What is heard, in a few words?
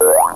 Cartoon Boing; The Yakyuken 2